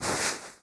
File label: doll Grab; Keepsake